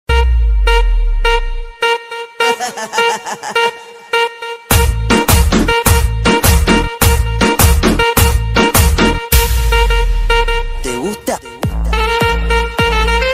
dj sound check ringtone bass boosted ringtone remix ringtone